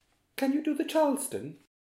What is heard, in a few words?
Disco